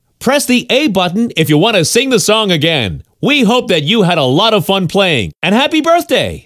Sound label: aftergamesong vo